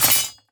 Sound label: warrior drop sword; gamerip